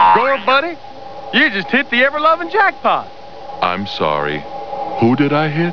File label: Jackpot